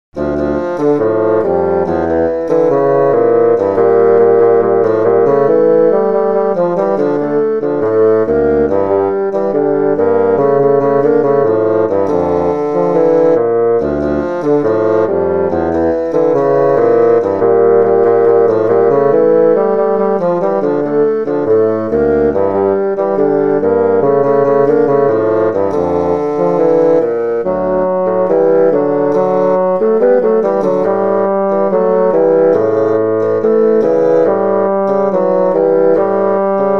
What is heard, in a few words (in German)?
Gefuehls Tango